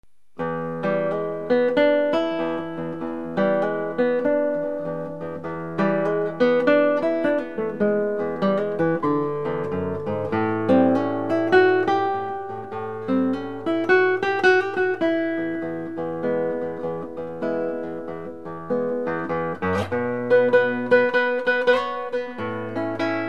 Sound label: Inside Blues